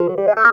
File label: Clavinet; Clavs; Ambient Grooves